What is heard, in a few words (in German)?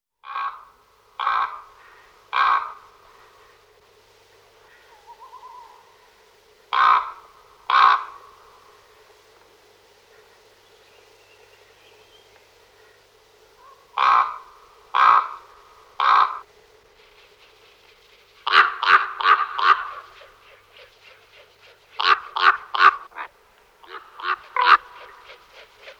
Vogel, Tiere